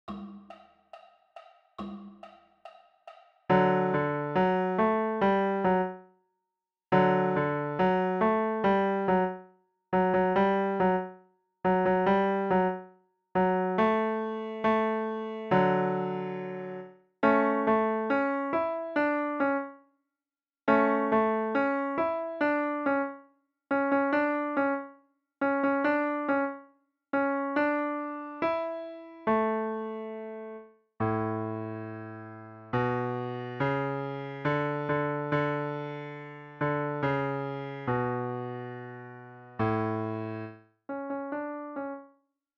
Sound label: Hallelujah left